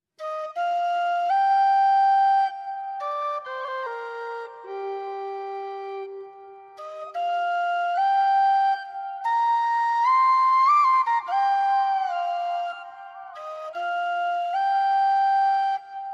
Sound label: Solo Tin Whistle